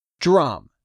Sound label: DRUM